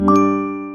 charging sound; android

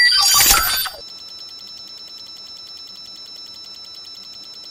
standby beeps

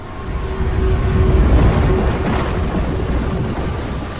ambush doors louder; troll

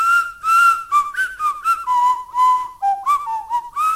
principal whistle

